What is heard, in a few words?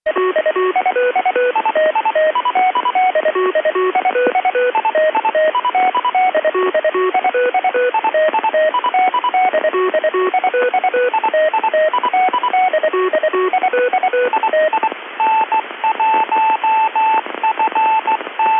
2m Fox